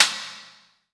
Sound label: A#3, JAPANI PERC; Northstar; The Wizard VOL-1